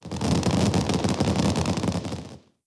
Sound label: Fire Works; blue archive